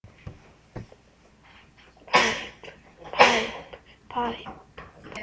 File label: pipe